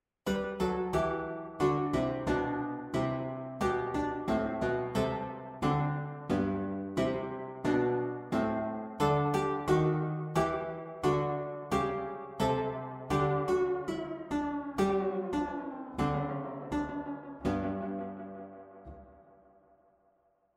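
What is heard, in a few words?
Contrapuntal, Pianoteq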